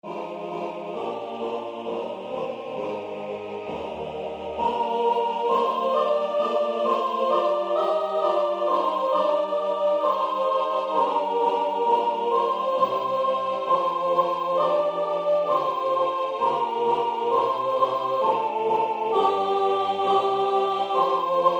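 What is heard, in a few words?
Ubi caritas soprano; Durufle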